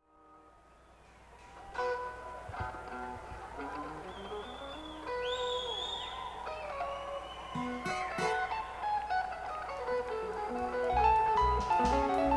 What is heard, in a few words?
Tuning